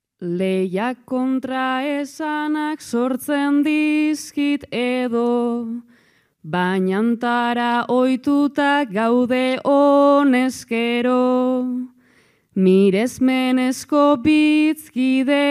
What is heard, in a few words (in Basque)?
agurra, bertsoa; zarautz